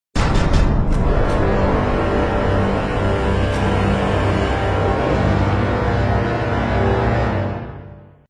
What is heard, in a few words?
Combat Stinger; Alice: Madness Returns unreleased original soundtrack